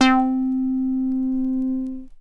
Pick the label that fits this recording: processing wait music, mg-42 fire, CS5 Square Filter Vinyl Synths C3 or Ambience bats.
CS5 Square Filter Vinyl Synths C3